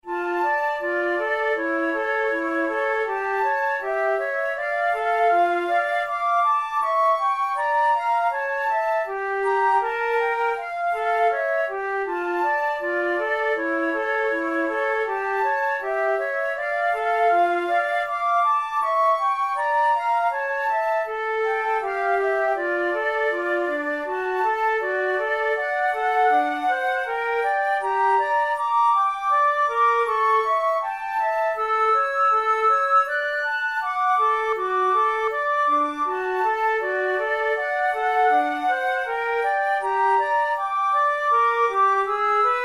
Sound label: duet in d minor, flute; kohler op93 no1 duet no3